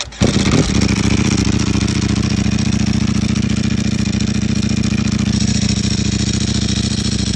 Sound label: Dry Clutch, engine roars